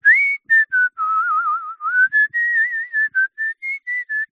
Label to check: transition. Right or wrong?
Wrong